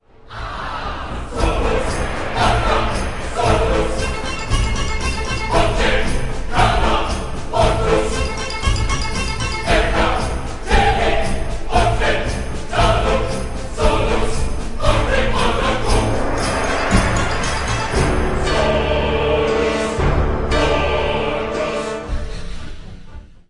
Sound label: zombie fight